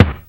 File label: shot hit something loud; SpaceScooter